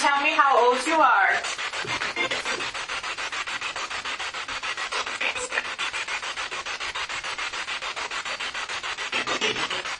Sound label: Spirit Box; Civil War Wing 3rd Floor Across form Armour Room; madison seminary investigation